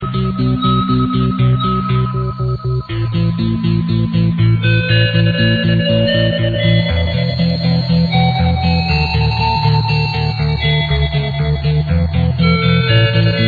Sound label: hymn